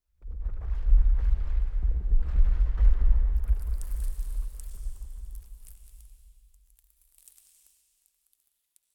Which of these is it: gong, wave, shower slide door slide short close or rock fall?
rock fall